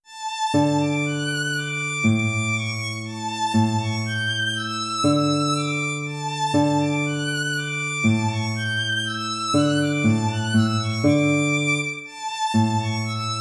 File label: waltz; Carulli Ferdinando